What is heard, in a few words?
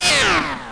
analog